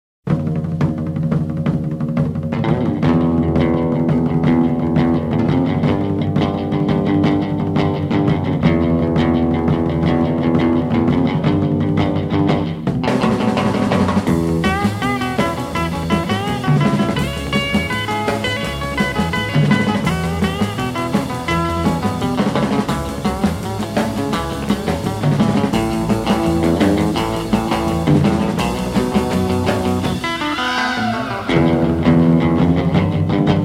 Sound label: Driving Guitars